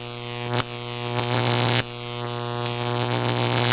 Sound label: hook; cstrike; jb engine